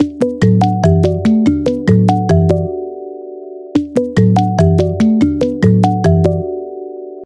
atrium apple tone ringtone; iphone

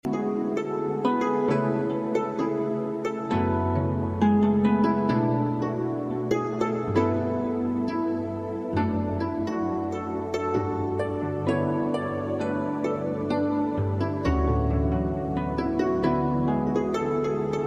refreshing background nature music tone ringtone

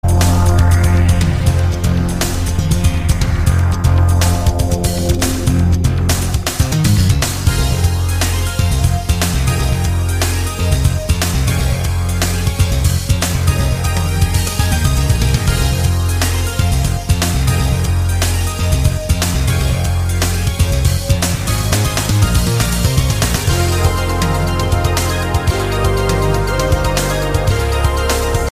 acer combat invoke ringtone

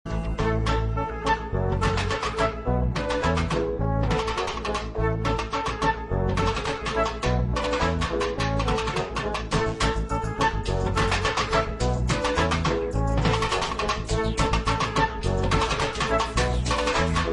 instrumental fusion classic ringtone

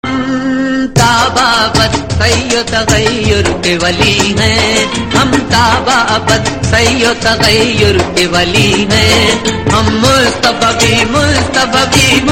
islamic ringtone

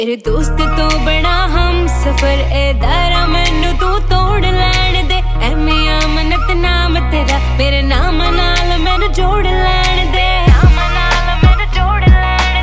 punjabi ringtone

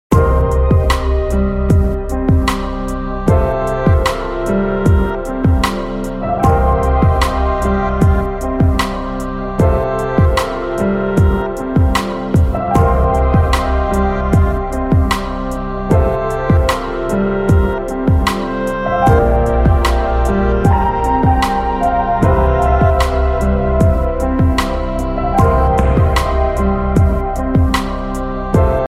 calm ringtone

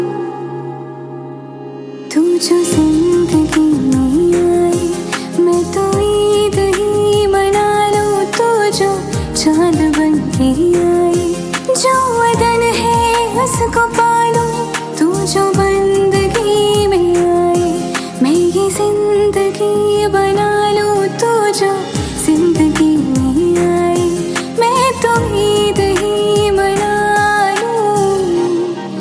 bollywood ringtone

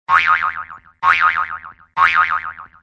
iphone x message iphone ringtone

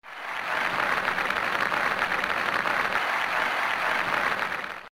clapping ringtone

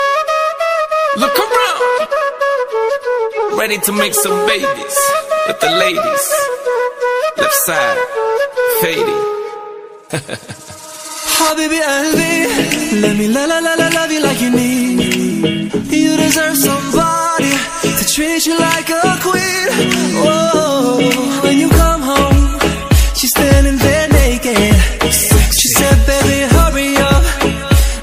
new arabic cool ringtone

